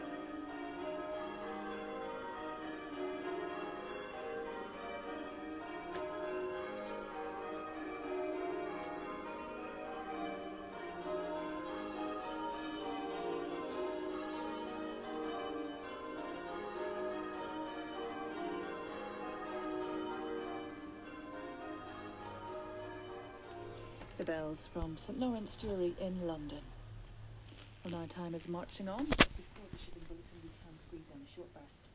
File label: bells